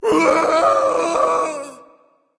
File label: fear berserker; cuchulainn